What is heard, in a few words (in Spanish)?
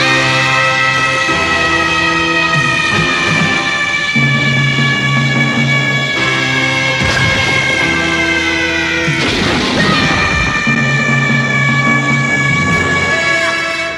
grito; gohan